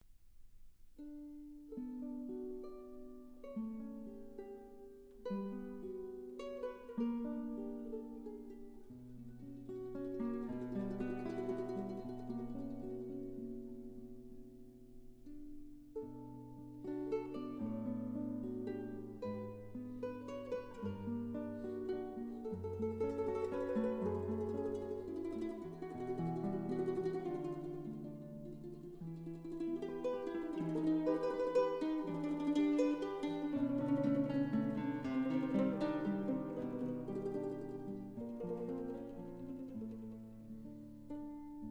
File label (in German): nacht und wind